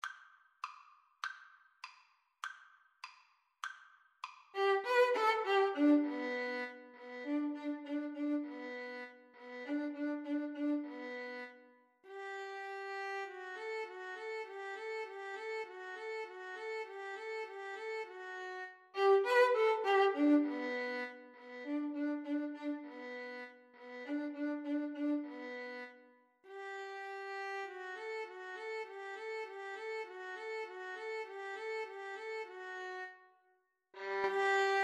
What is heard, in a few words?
Violin Flute Duet